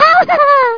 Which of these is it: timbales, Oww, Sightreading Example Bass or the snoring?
Oww